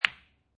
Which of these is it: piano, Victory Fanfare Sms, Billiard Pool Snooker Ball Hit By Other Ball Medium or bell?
Billiard Pool Snooker Ball Hit By Other Ball Medium